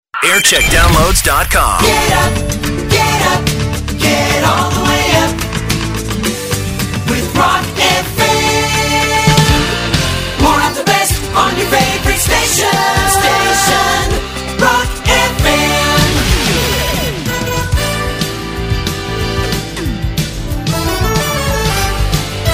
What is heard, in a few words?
jingles; rock fm